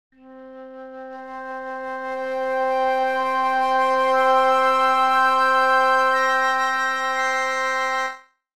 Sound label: harmonics